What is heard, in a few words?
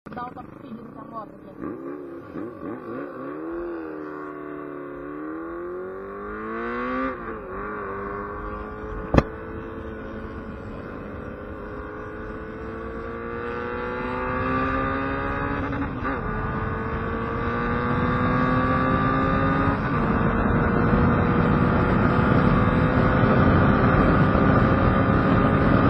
CB 1300